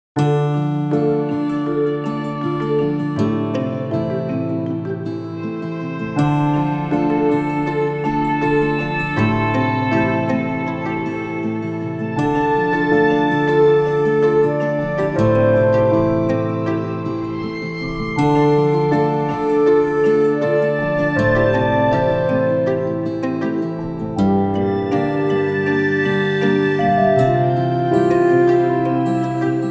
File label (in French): ZEN MEDITATIVE Nature et légèreté